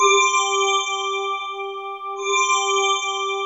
SPARKLE; ATMOS

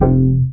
DM BAS, Dance Mega Synth; Best Service

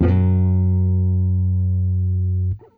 JAZ OCT SLUR, guitar; Quantum Leap - Guitar & Bass

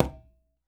Quinto, Percussion

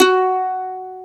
Mandolin F#3; Planet Earth; Digital Sound Factory Planet Earth

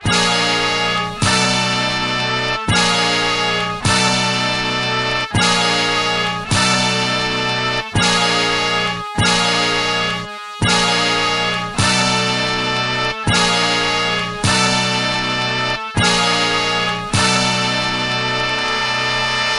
Melody Intro; Cinematic Funk; Sonic Foundry (Sony Creative Software); Urban Tone Poems

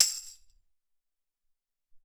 Tamb Open

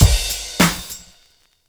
drbreak, drumbreaks; Masterbits; Vinyl Attack

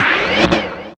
loop; X-Static Goldmine 3

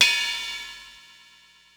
Dance Ride, Ensoniq ASR-X Pro

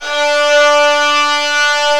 VIOL, ORCHESTRA; AKAI S6000 CD-ROM - Volume 1

